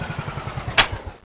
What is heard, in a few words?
clip out, weapons; cso; turbulent7